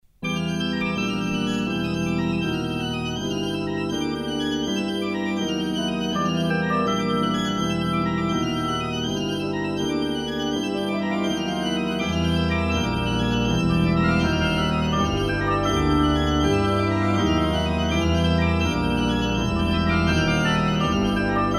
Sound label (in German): Orgelthema; „Moskau – Kommen und Gehen“ nach A. Tschechows „Drei Schwestern“